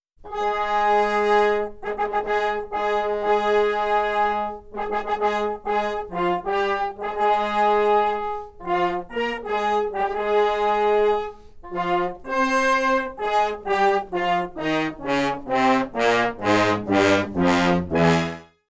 Orchestral, Anechoic; OPENAIR

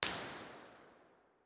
RIR, cliffords tower

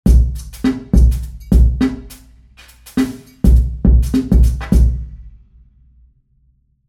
drums

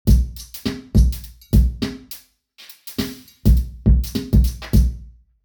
drum; dales; trollers gill; site2 4way mono processed

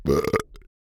burp; OPENAIR; Anechoic

